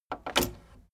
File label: tape deck open slide